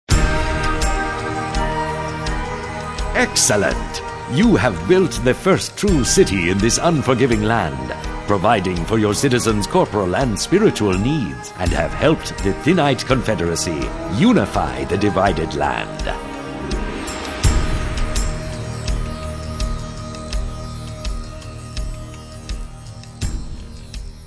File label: victory; pharaon